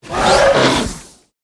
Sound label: werewolf boss attack voice bottom